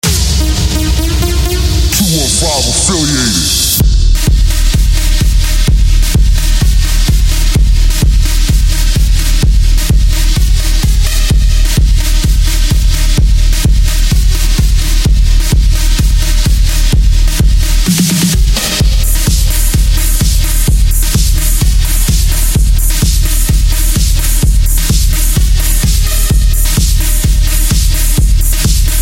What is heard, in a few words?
BIG ROOM ringtone